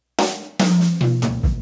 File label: 145 NuJazz Tom Intro, Drums